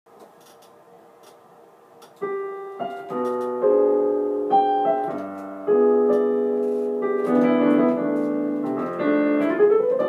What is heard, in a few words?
Gentle Waltz Piano Cover